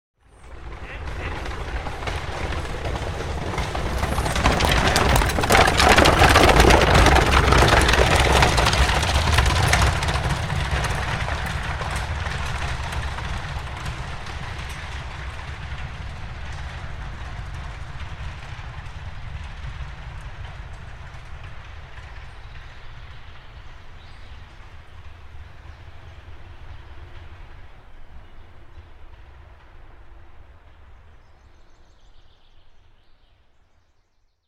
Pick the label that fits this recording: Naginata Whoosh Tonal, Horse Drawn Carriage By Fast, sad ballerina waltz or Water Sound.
Horse Drawn Carriage By Fast